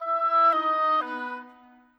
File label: Oboes